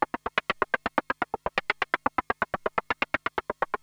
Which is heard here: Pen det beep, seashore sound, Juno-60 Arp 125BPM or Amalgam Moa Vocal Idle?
Juno-60 Arp 125BPM